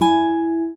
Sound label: Classic Guitar